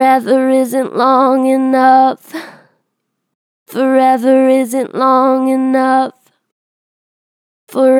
Vib Forever isnt long enough, Vocals, Natural Vibrato Strong; Harmor Vocals